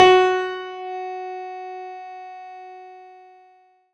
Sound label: F#4, synth; 90 s Sample CDs; NiceBeats; SynthLine VOL-23